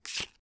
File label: Panel Admin Card Move; Among Us